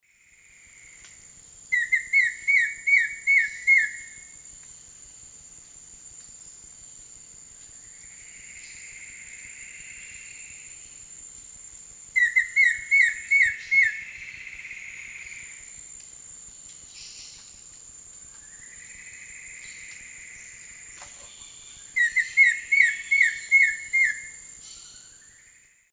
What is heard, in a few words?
Myrmeciza melanoceps, song; S. America; Eastern Lowlands